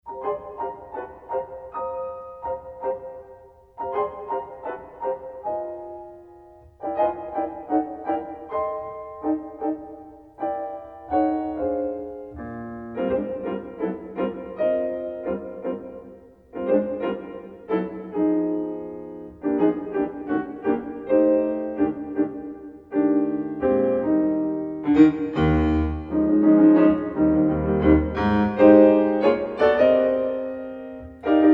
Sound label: Play For Piano; Marlais Book 5